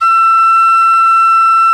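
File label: penny whistle, World Winds; E-MU Producer Series Vol. 7 – Old World Instruments; World Combos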